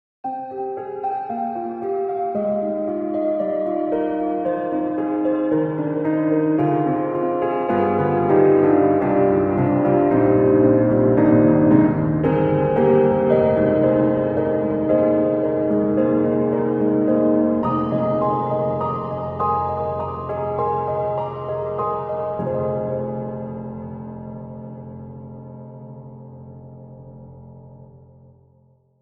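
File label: Metallic Reflections, piano; Steinberg HALion 7; Verve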